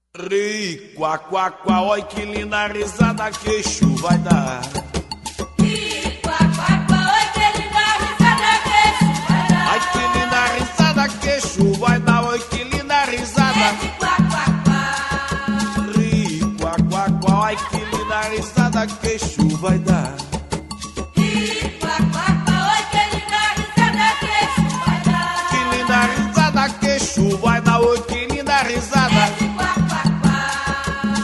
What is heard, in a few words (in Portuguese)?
que linda risada; exu